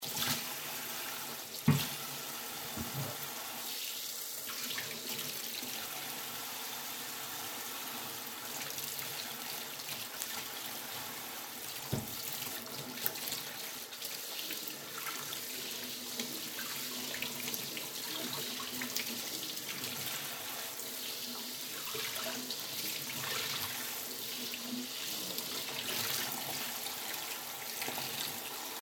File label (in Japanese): 洗い物をしてる音